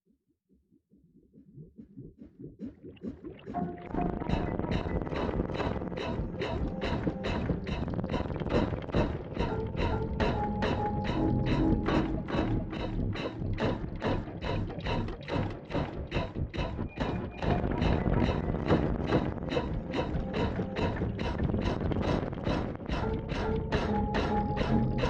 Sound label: Music Potion Room; Music Potion Room Castleboard version